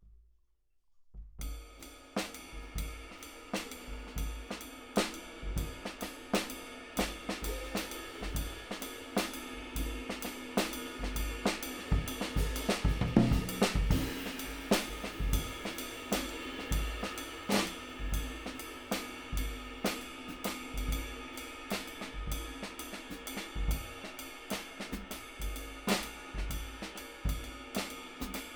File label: Kit